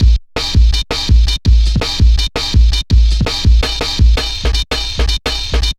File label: Klanga, drum loops 165.5; Jungle; Zero-G Jungle Warfare 1, 2 & 3; 90 s Sample CDs